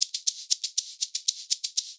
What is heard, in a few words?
120 SHAK, Percussion Loops